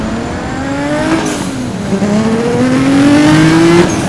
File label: first, vehicles